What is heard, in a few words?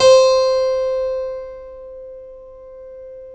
C 2 CLAV; Keyboards Acoustic; AKAI; USB Soundscan vol.17